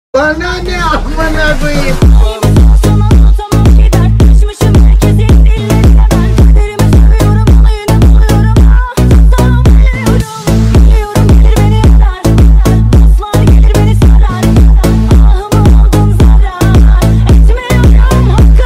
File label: bass boosted